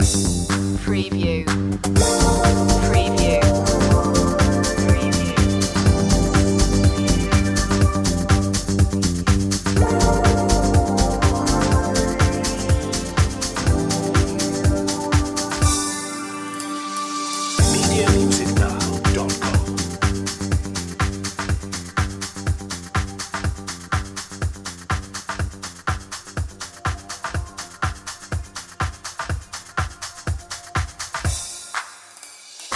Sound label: astounding electricity loop